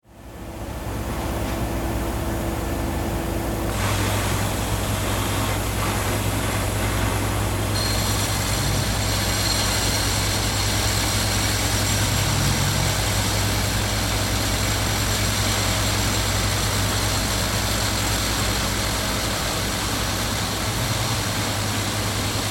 Cement Cutter, Industry, Construction, Cement, Cutter; Blast Wave